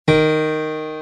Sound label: 2 D#, piano